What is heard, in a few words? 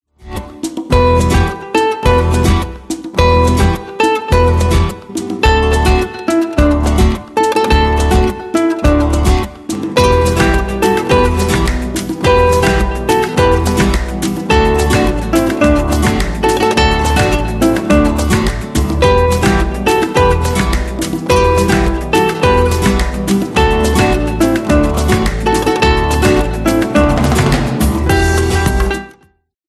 guitar